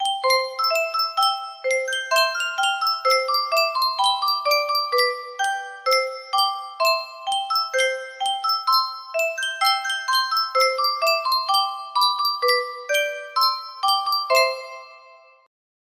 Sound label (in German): Yunsheng Spieluhr